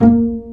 PIZZ BASSES; E-MU Formula 4000 Series Vol. 5; Protozoa; Proteus2 Instrmt

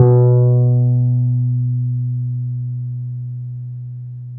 Wurly B, Keyboards of The 60's and 70's

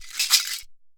MARAKA SHAKE; Best Service; World Colours

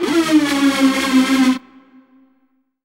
RAVE, RAVESYN

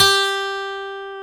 GTR 6-STR, Steel String; Roland LCDP02 Guitar and Bass

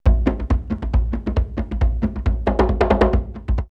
drumloops World Ethnic, 136; Mombasa; X-Static Goldmine

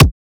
kick e

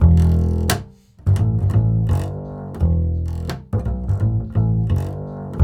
acid E, 85 acid swing, bass; Spectrasonics; Bass Legends

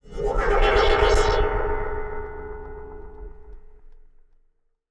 Moog LPF & Delay, contact mic, Wire & Tin

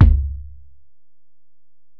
Ac BD, Alesis DM-PRO; 300 Drum Machines

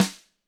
GRCH HI, GRETSCH SNR; ILIO; Double Platinum Drums 2

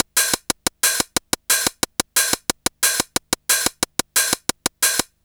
Hi Hat Loop

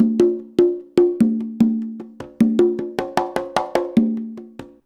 CONGA, Modern Percussion Loops

